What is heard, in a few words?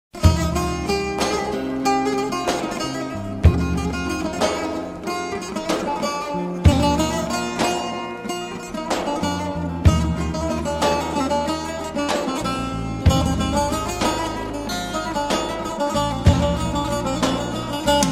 kurdish music ringtone; Kurdish Nokia Ringtone